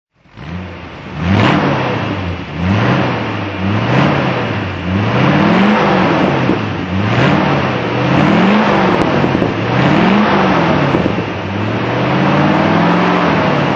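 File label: facel vega hk500 automatic throttle, engine sound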